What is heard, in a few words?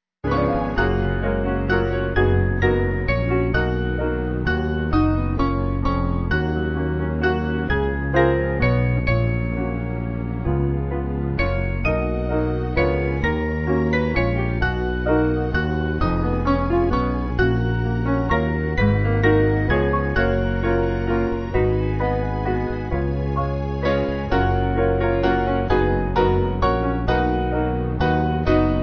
Piano; Mainly Piano (CM)